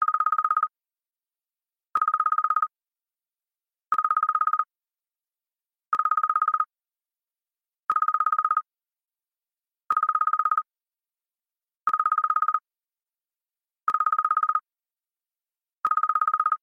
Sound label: iPhone 14 Pro Beacon; Klingelton